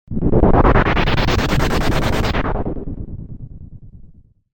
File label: noise